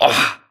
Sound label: grunt hits; Titanfall 2